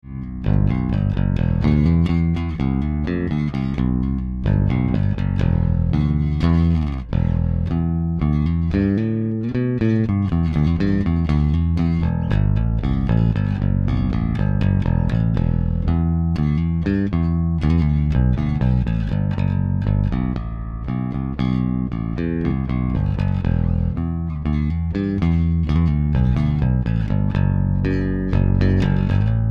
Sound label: E-Guitar Rec Roh